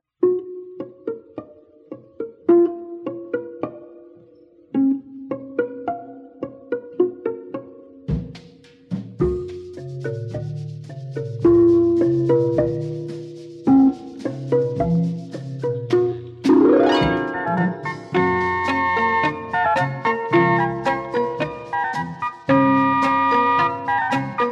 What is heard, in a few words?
Bongos